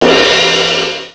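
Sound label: CRASH CYMBAL; CYMBALS; CMI Sound Library Rev 1.3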